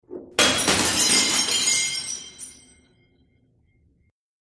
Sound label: Bottle Rolls Down Echo